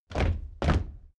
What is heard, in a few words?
step military march stop